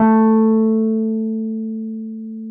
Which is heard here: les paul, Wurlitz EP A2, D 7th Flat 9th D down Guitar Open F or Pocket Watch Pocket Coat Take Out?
Wurlitz EP A2